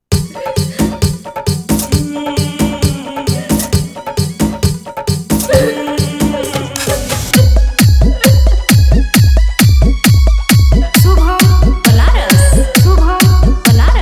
Dj Song Hard Bass Mix

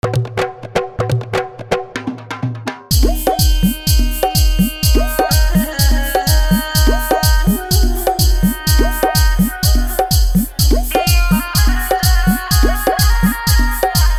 Sad Song

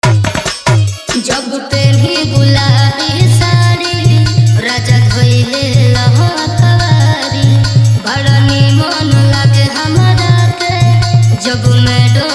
New Bhojpuri Dj Remix Song

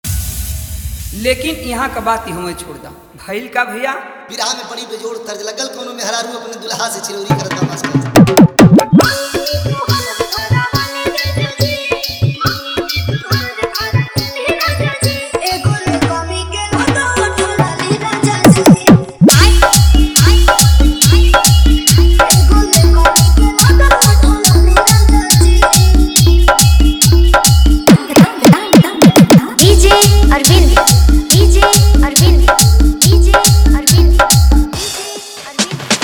Hard Jhan Jhan Bass Mix

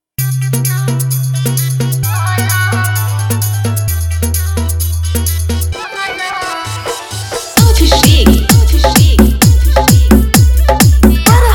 Hard Vibtation Bass Mix

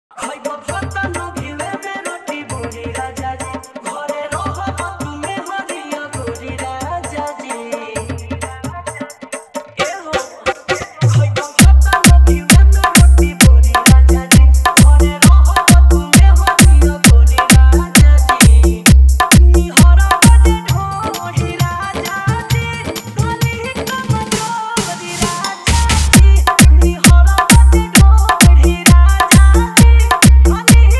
Bhojpuri Dj Remix Songs

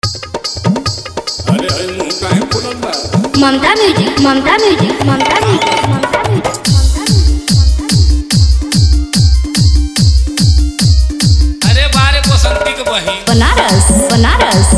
New Bhojpuri Dj Remix Song

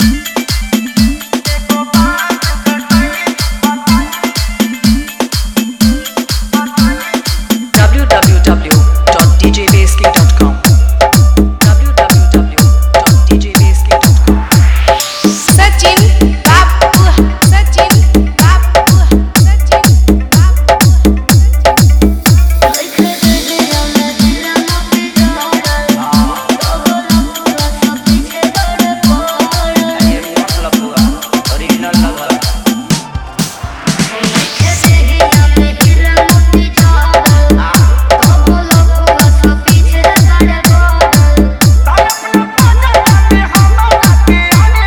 Bhojpuri Dj Remix Songs Hard Vibration Mix

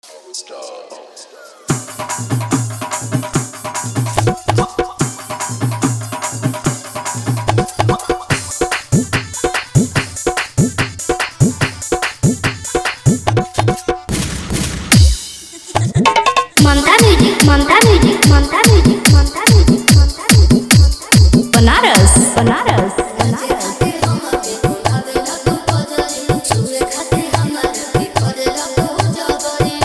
New Bhojpuri Dj Remix Song Hard Bass Mix

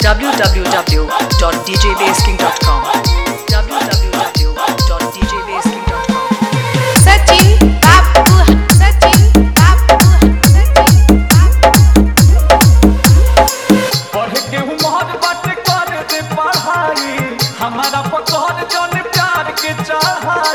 Hard Vibration Mixx

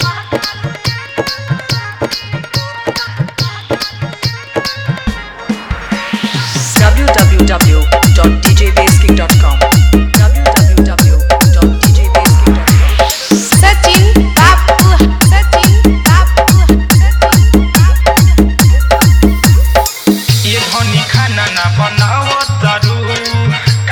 Hard Vibration Mix

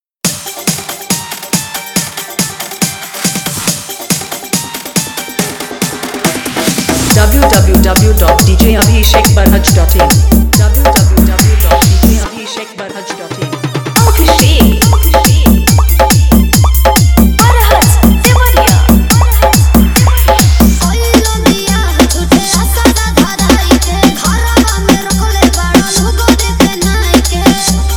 Power Full Jhatka Mix Bass

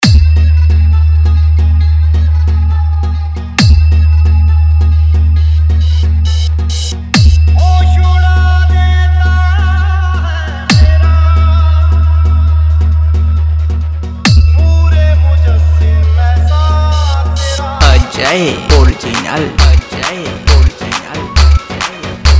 New Hindi Dj Remix Full Vibrate Dance Mix Song

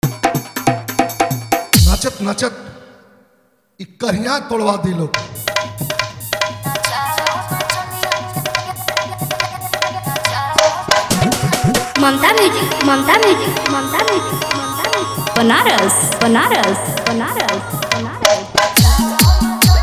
New Bhojpuri Dj Remix Song Hard Mix

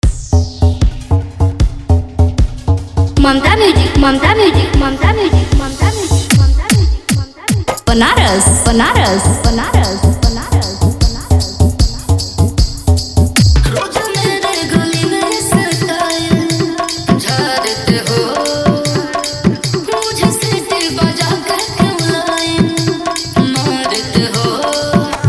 New Bhojpuri Dj Remix Song

